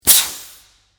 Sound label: Pneumatic Grease, Scifi, Pneumatic, Air, Burst, Science, Fiction; Spacecraft; BlastWave